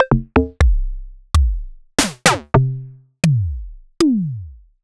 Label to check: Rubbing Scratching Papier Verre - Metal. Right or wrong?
Wrong